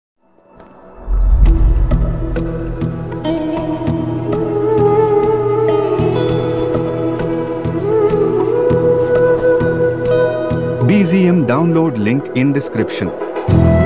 love bgm ringtone south bgm ringtone telugu ringtone; Joe